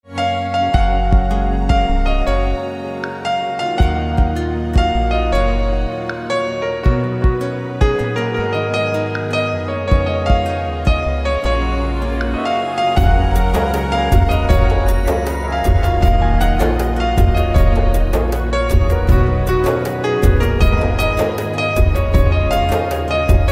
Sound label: Piano